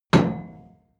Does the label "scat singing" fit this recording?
No